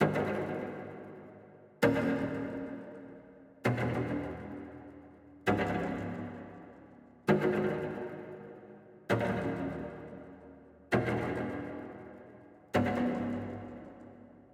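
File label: 132, Strings Plucked Chord, D minor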